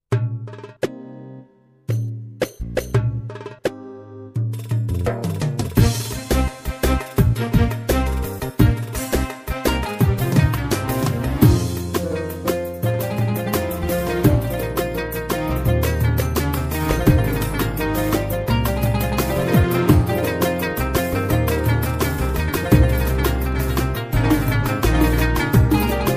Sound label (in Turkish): istanbul ciftetelli, korg pa55 tr